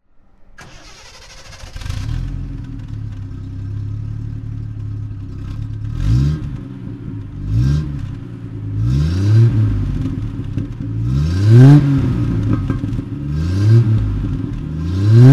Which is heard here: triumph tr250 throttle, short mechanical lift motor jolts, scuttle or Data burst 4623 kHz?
triumph tr250 throttle